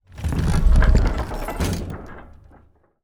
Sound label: Gates, complete; 0AD